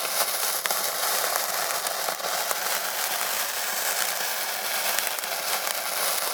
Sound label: Sparklers